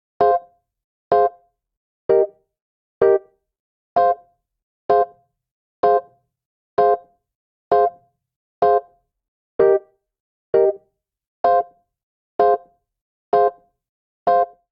Progression Dub i - iv F Minor 64 bpm, Electric Keyboards; Ableton; Factory Packs